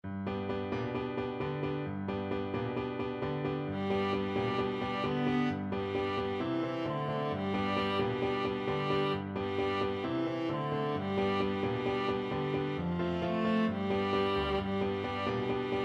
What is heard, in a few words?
viola